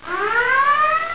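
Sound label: phone busy; Total Chaos Nukem 3d Pack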